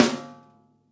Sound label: warm snare drum a sharp key, snare one shot tuned to the A# note